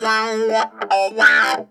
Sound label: SINUS, Funk Guitar; 90 s Sample CDs; Zero G